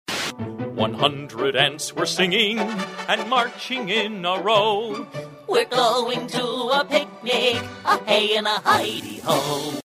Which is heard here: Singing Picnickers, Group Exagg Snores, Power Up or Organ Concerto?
Singing Picnickers